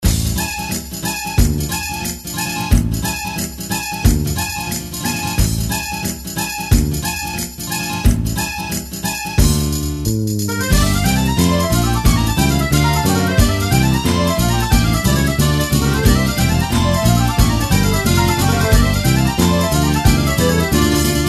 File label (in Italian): Pizzica Rossa, suoneria polifonica per telefonino; rondoniano